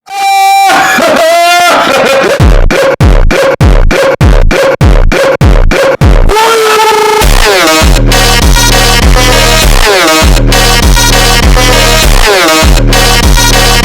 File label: KOREAN FUNK, phonk